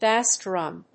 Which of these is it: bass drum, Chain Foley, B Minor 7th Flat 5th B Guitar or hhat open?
bass drum